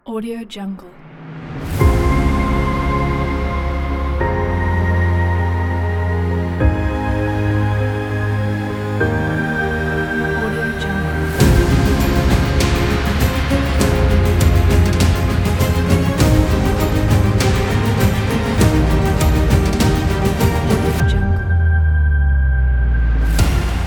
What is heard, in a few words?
epic heroic logo